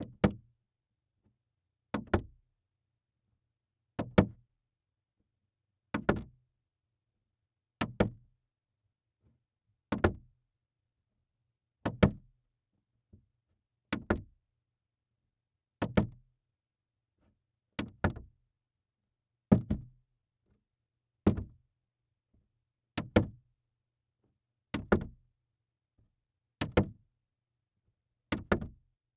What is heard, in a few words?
Foley Footstep Hard Sole Dress Shoe Slow Walking On Wood Platform; Foley Footsteps